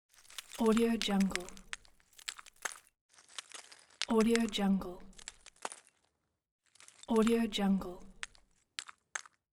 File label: precious stones